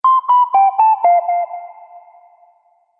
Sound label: Soft Subtle Notification; Musical